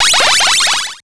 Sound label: Stamp; BOMBERGIRL RAINBOW; Arcade